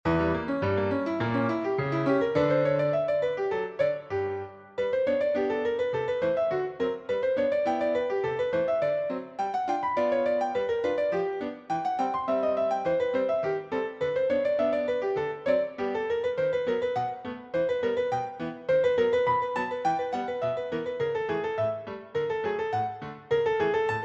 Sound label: Polka in G